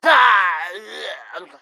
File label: Pecheneg Red Death